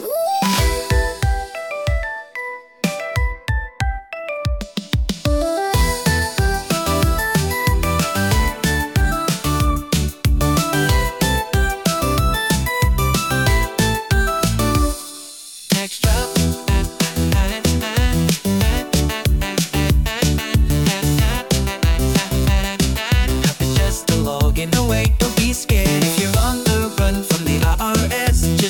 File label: Catchy Jingle